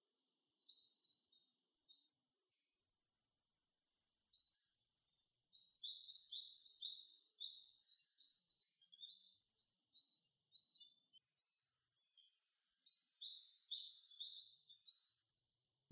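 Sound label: varied tit song; yamagara saezuri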